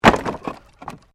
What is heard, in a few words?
Wood Movement Medium; Material components for constructing more complex sounds; Materials